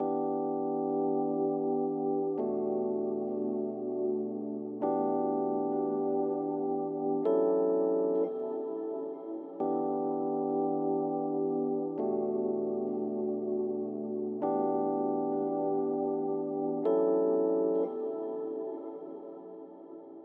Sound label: FUNK GROOVE E PIANO PLUGIN ON